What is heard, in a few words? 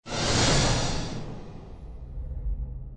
castle mirror appear; snow white